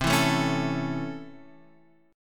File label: C sharp Minor Major 7th Sharp 5th C